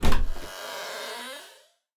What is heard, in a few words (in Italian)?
Scrigno; BLIND QUEST - The Enchanted Castle